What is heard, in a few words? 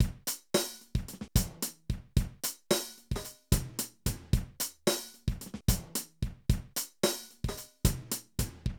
Drum loops, 70s funk